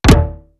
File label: TWANK; East West; Global Sounds